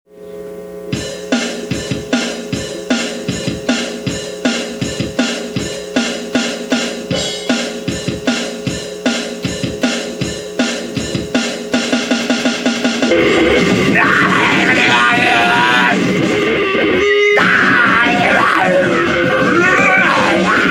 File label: Drum Beat